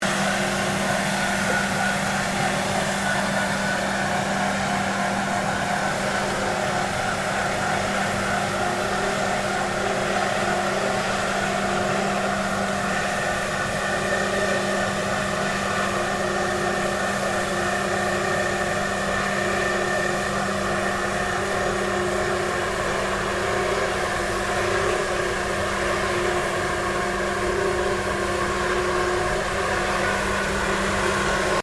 Cleaner Hakomatic B70Cl Maneouver, Gas powered floor polisher; Cleaning; Household